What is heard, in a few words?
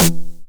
909 SD Tape Sat